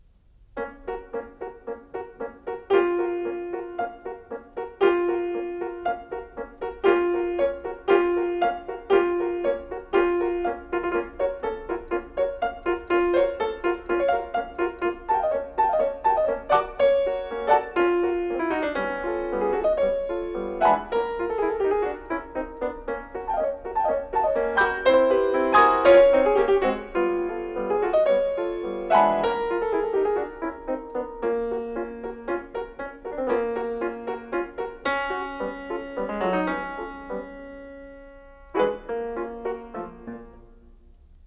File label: for piano, Ridicolosamente; Visions fugitives (20)